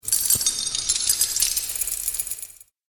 Shackles Of Sorcery, Chains, Clink, Clatter, Clang; Magic Sound Effects; Clink, Clatter, Clang, And Clink Are The Sounds That These Chains Make.